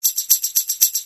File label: TAMBRINE